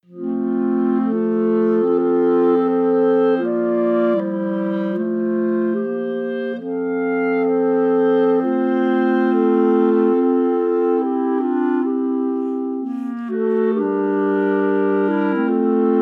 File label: Prayer Ensemble complete version; Humperdinck